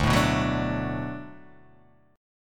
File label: D 6th D down, chord